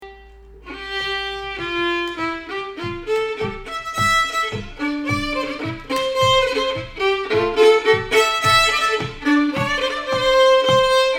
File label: New Jenny Lind Polka